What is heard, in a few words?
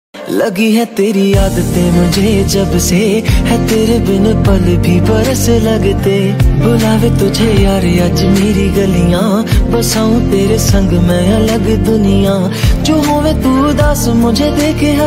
flute ringtone instrumental ringtone